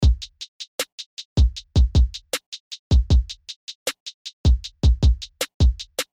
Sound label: Trap 78bpm; inside rap creating an instrumental